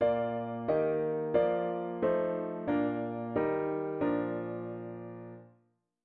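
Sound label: diatonic inverted V7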